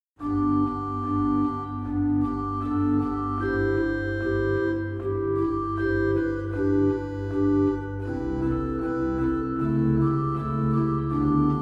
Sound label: Ped Subbas 16 Holpyp 8 Rugwerk Quintadena 8; Zwolle; Ps 60; Hoofdwerk Roerf